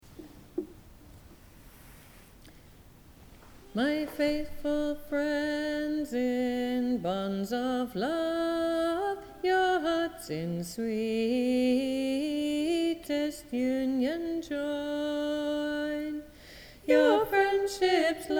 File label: Thunderbird TENOR